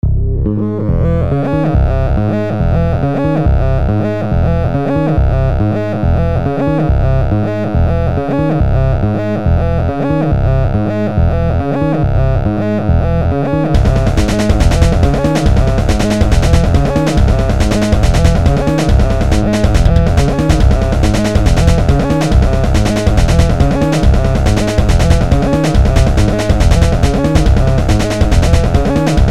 happy hard core bass

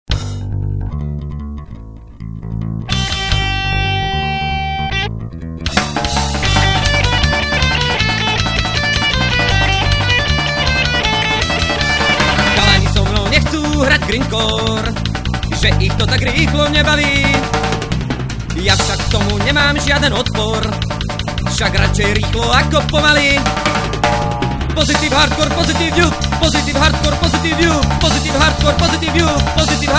grindcore

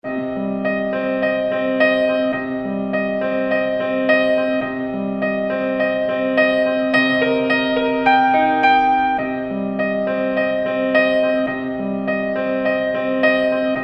instrumental

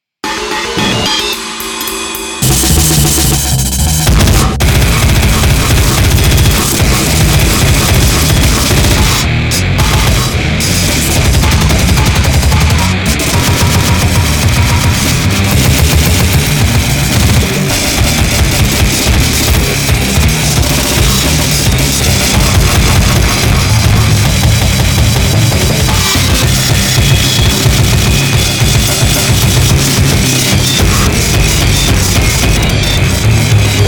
without vocals

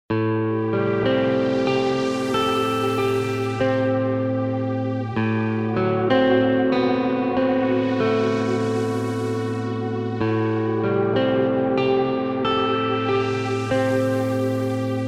rain

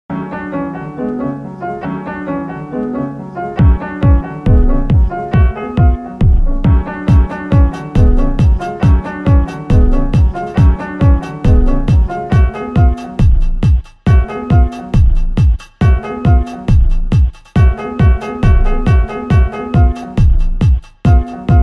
piano fantasy

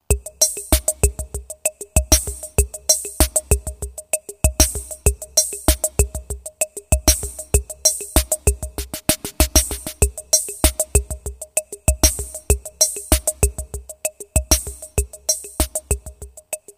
roland cr 78 compuphonic test

